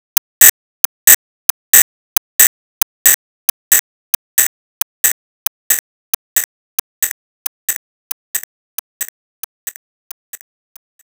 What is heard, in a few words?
Enemy Time Magic Active Clock Loop